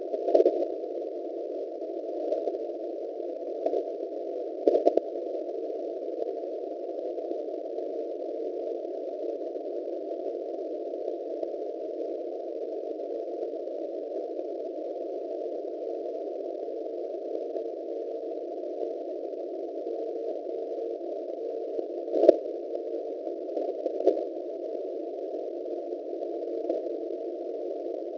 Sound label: 600uW 3579.50 cw